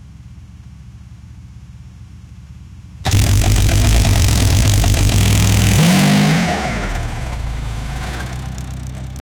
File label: deep v8 muscle car engine